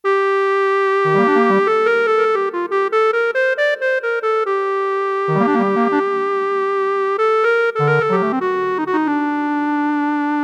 REED, Roland JX-1; Factory presets

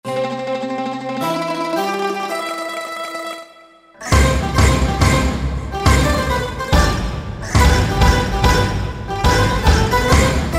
Celebrate!, Korg N364; Factory Combi demos

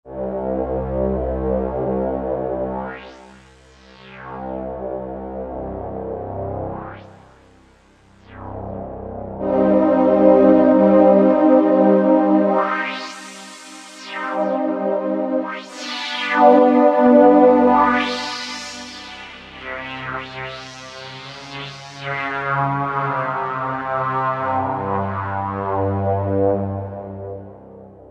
BandPass Mod, Roland JV-2080

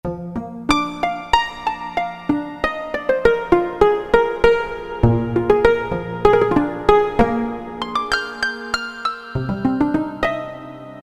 Harp Strings, Roland PN-D50-04

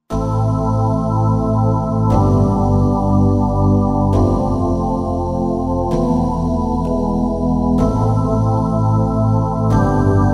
Stacc Heaven, Vintage Synth; Roland SR-JV80-04

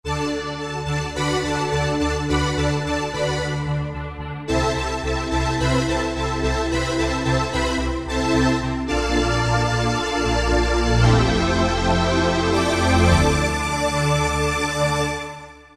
Phaze, Yamaha TG500

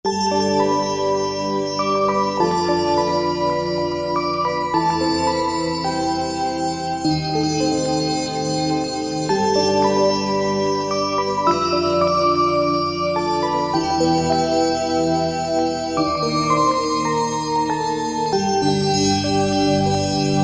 Korg TR-Rack; Aurora Borealis

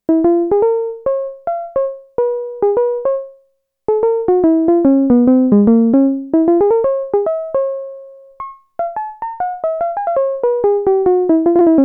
ELECTRIC PIANO I, Roland SH-101; Factory Patches

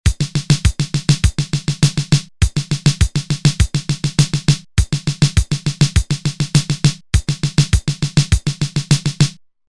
Fill In 4-4, Roland TR-505; Factory Rhythms

